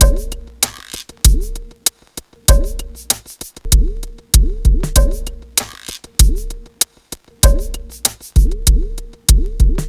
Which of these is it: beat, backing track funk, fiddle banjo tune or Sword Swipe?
beat